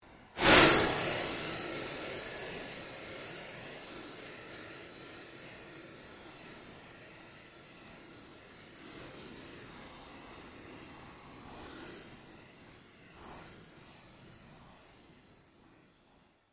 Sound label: pulse fade, Various sweeps, pulses and drones; Rises and Dives